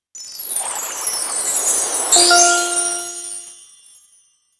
Dress Clothing Added; The Princess and the Frog; wii windows; Dress Tiana Clothing Added

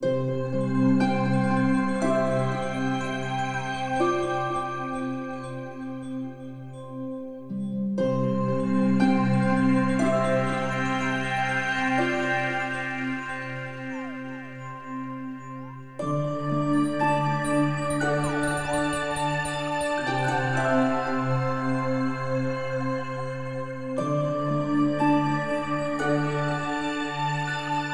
Pad Music; Imagine

